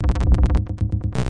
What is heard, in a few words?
choppy distorto pulsar; Electro and Synthetic